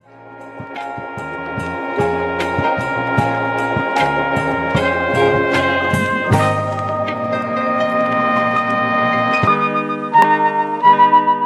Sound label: Hip Hop nostalgic Drum Kit